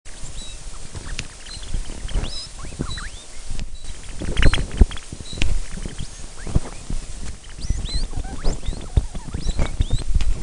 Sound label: degu